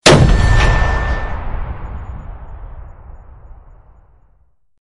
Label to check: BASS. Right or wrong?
Wrong